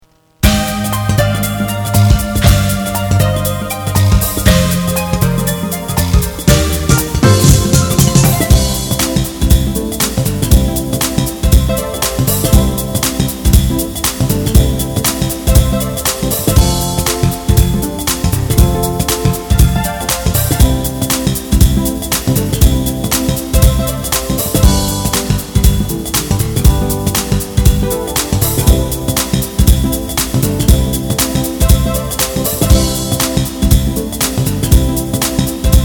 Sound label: background music